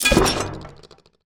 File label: ballista hit ground; ships siege